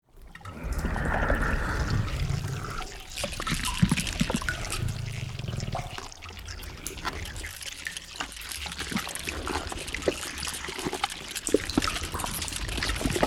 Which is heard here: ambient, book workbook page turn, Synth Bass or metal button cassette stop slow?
ambient